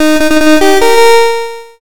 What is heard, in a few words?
fanfare